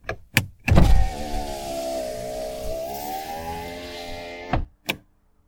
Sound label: op pwr window, car